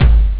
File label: dry tom; jazz jackrabbit 1